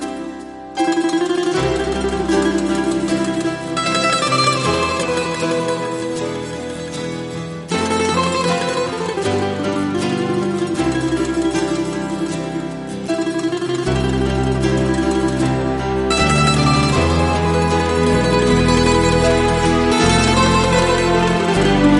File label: Instrumental